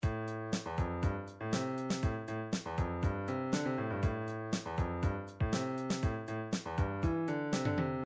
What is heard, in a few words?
funk, midi